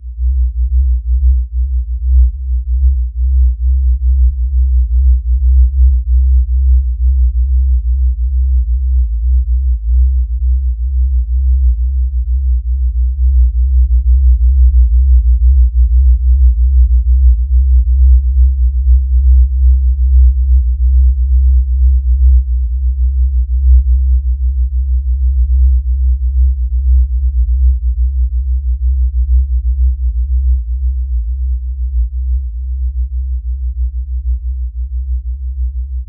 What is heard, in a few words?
low frequency sound vibra; earth